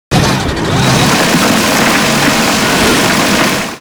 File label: rock saw stationary; LoL; emitters map8; odin